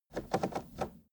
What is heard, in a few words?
car temperature knob turn short slow